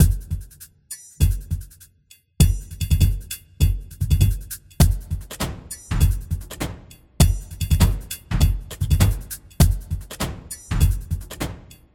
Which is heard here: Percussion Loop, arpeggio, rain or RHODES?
Percussion Loop